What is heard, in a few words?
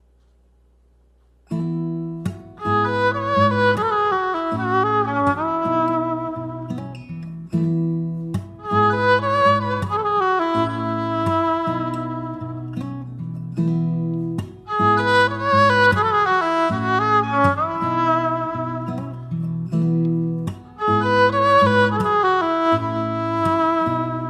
Romantic Pop